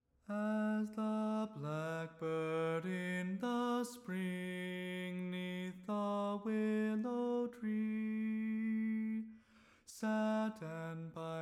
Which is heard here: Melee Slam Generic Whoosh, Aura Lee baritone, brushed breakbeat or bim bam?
Aura Lee baritone